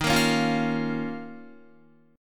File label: Eb 7th Suspended 2nd, Guitar, chord; 10,13,13,10,10,13; Eb7sus2 strummed; JGuitar